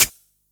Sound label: Closed HH